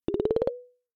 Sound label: Dock